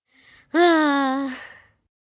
Sigh Frustrated, Gasp, Breath, 1 child, tired, sigh, vocalized, human voice, kid; Gasping; VoiceBox